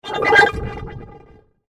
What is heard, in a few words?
Alien Space Machinery; science fiction sound effect; star wars / trek style games